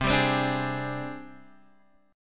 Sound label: G sharp Major Sharp 11th C, chord